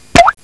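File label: Water drop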